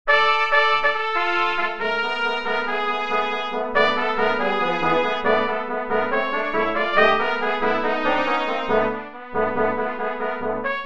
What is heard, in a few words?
brass quintet